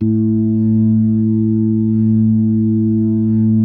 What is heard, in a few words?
A2, B3